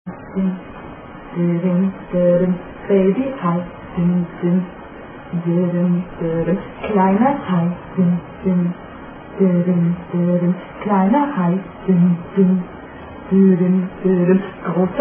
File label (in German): German Attack Song; Kisame X Sakura Xd